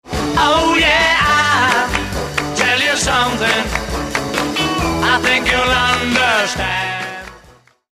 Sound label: The Note